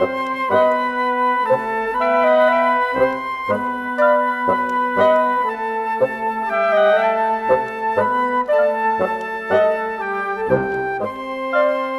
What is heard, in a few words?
Brass Winds; Sonic Foundry (Sony Creative Software); Orchestral Series VOL-3; Cinematic 22